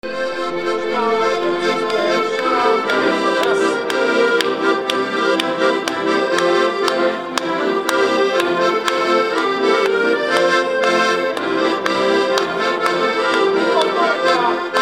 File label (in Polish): proba akordeon; bolszewo; obchody dnia jednosci kaszubow